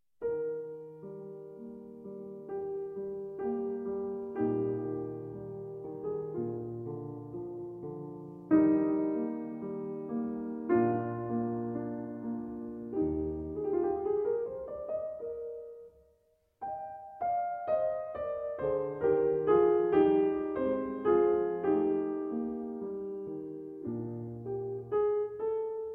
Piano Sonata in C Minor, 2 Pianos; No. 14; Arr. For 2 Pianos By Edvard Grieg; II. Adagio

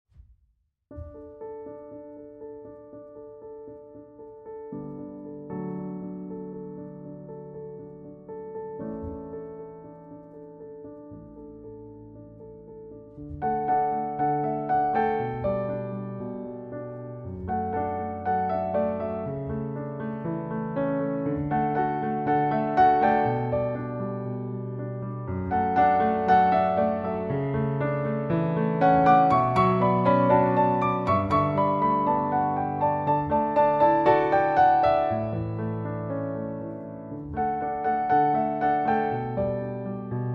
Piano Version

